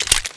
bullets in

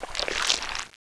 tentacles deploy

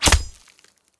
knife hit wall; karambit

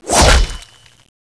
knife hit

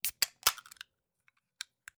open soda can; cstrike

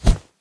knife slash

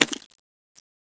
stimpak inject

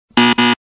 warn

